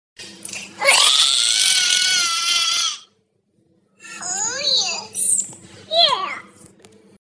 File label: burp